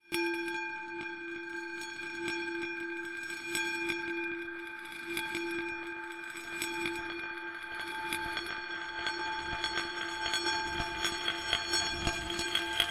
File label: sound design bells med reversed reverb; zapsplat